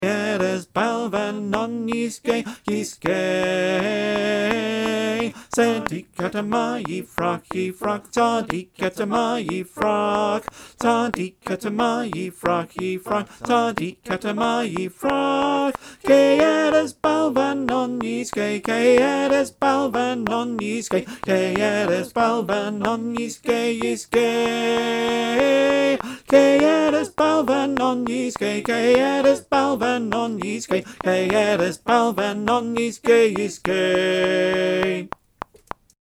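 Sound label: Tenor click track, choir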